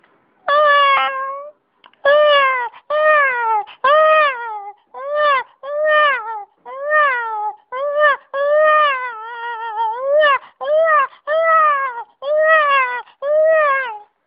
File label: Baby Crying